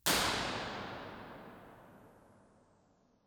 IR fonometro 12.5m; PalazzoCusani

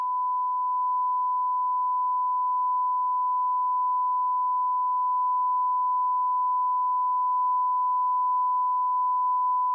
registrazione 1khz 1vrms, nastro; magnetostrittivi

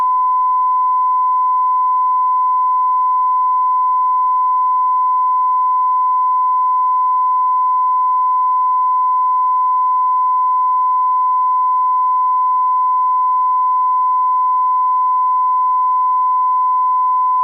Calibrazione 94dB

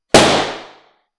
Palloncino

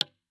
ir magnetostrittivo, centro pannello legno; magnetostrittivi; dml-legno+stucco

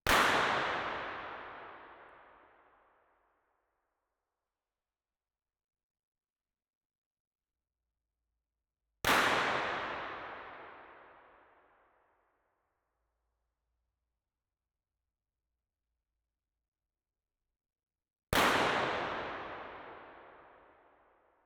Spari Binaurali; S-Ludovico; ComunePR

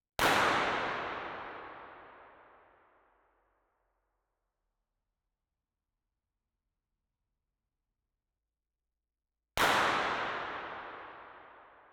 Spari Binaurali; S-Ludovico; ComunePR

